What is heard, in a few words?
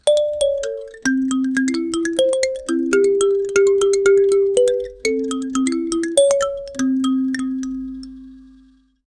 joyful and dynamic balafo; africa